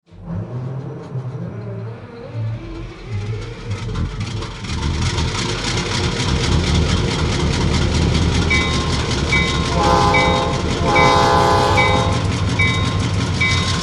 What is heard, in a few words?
EMD 645; lirrhorns